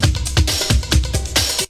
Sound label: Bite Size Beats